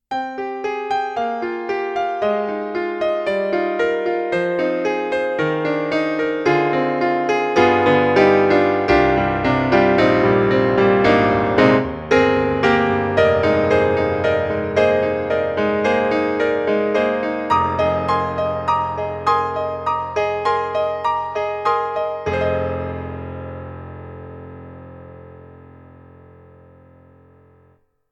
Kurzweil MicroPiano MP-1 Stage Piano, solo tuning (beat-tuned)